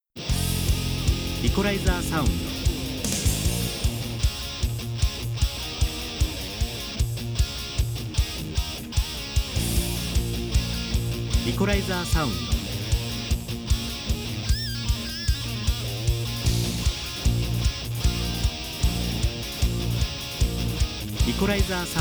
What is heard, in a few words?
rock eq